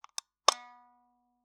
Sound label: Taxi Meter